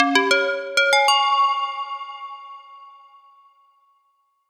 notification mobile

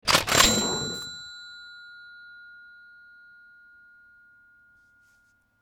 Cash Register

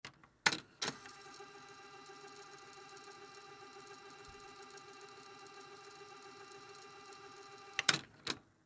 Cassette Tape

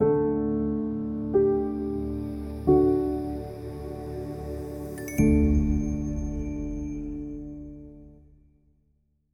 Corporate Logo; موزیک پس زمینه

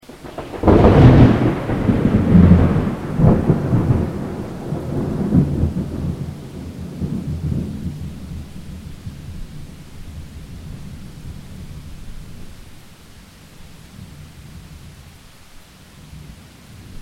Thunder and rain

